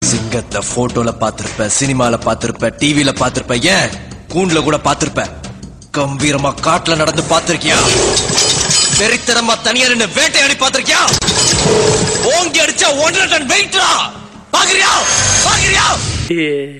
laughhhhhhh

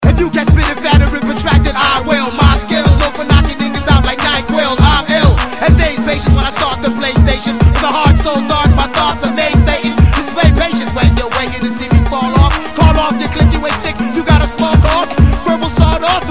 desert rap; hip hop

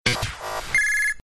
Fast Tone

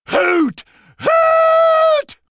Sniper Hoot

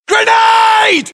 Grenade Clink

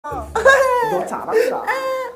laughing

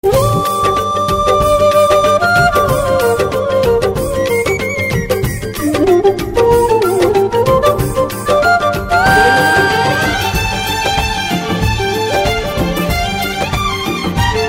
flute music; classical

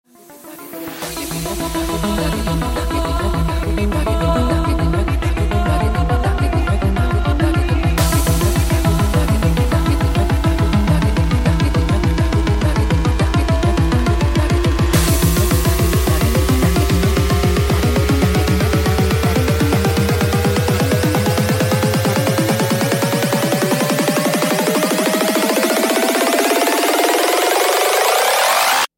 Pop Art

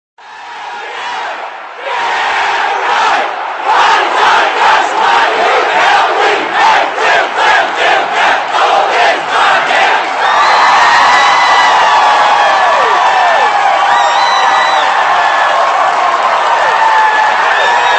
chant

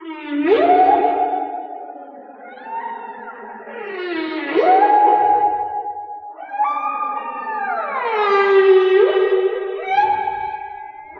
Sound of Whales

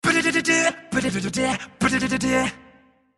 Bass Drop

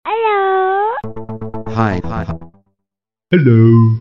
tamil baby

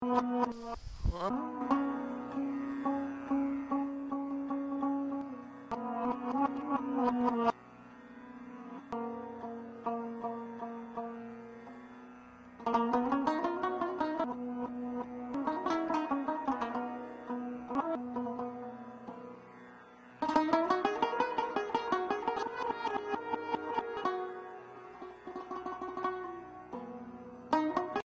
Best Rabab

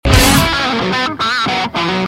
guitar rock sms; ringtones

